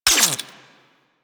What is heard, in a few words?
Quill Dart Gun Electric Mech; Warframe